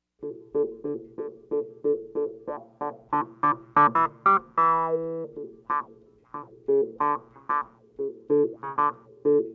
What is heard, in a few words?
clavinet, analog funk